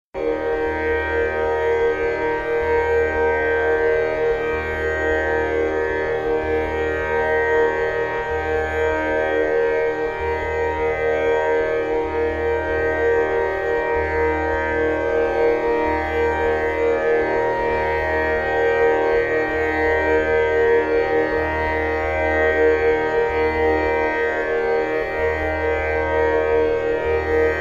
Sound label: Tanpura